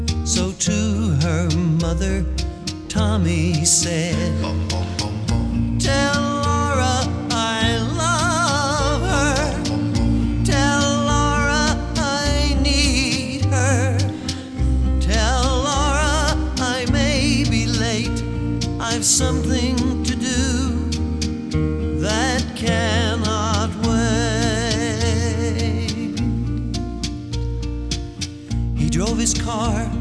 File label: country